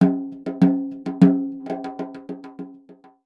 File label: Egyptian Dance